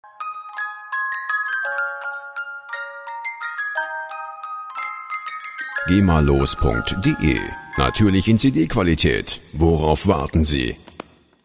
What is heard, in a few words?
tin christmas music box